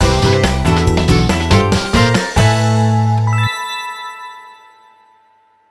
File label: Albatross Jingle; Fun! Fun! Minigolf; 3DS; Wii